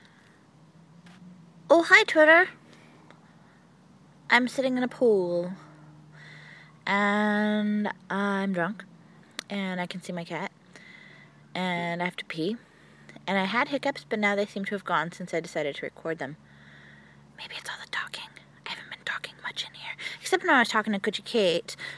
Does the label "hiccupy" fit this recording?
Yes